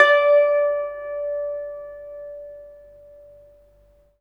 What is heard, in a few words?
SITUR D5; Spectrasonics; Heart of Asia